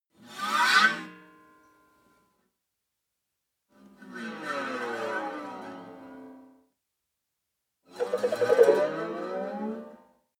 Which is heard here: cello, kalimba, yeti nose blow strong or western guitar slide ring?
western guitar slide ring